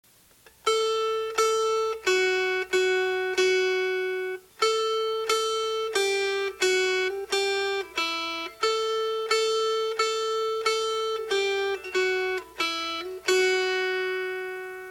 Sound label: Melody